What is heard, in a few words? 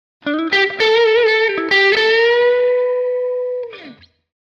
Blues Guitar - Short Solo; Hollywood Edge; sound designer's toolkit v2